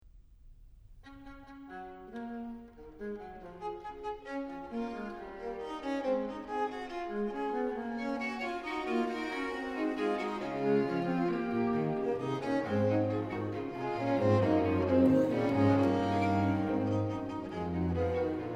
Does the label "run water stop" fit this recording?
No